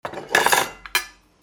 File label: KITCHEN